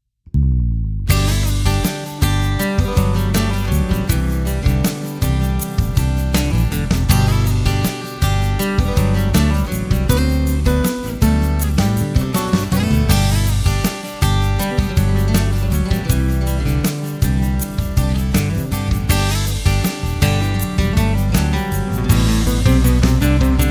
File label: acoustic love